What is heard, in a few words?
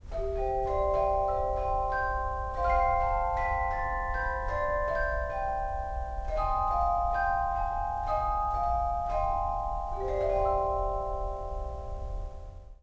chrysoglott